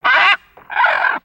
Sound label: Bird Amazon One shots; ambience; Lego